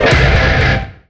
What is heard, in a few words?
Music Note; Boss Ni; Pinku Kult Hex Mortis